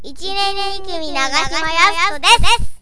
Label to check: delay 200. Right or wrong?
Right